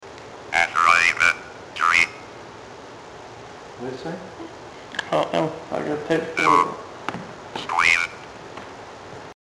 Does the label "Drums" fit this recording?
No